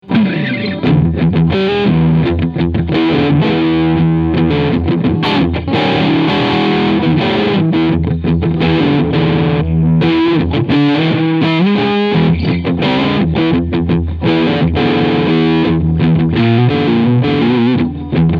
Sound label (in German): Traveler Guitar Travelcaster Deluxe Crunchsound Steg Mittel Pickup